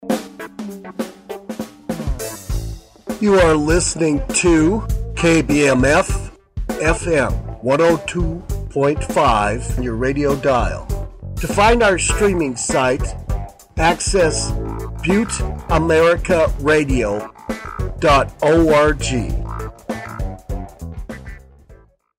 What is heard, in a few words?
Funky ID; Hamer; STATION IDs